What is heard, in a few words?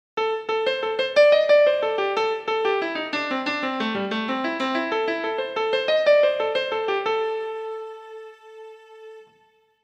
blues